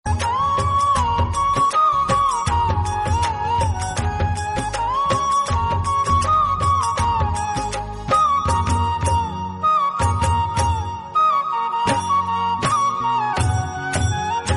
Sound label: instrumental